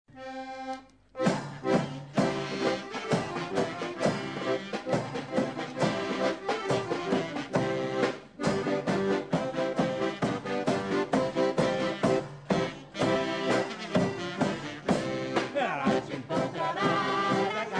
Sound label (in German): marsch; Zirkus Habakuk